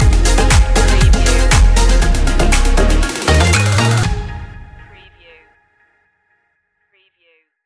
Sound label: tropical summer stinger